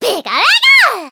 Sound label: pokemon cry